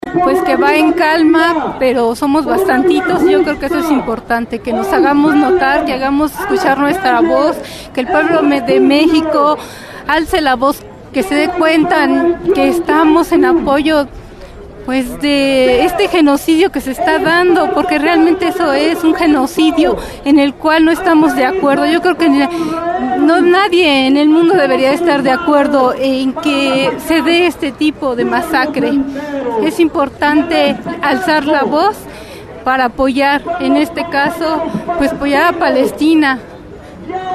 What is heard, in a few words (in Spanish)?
marcha; palestina julio 2014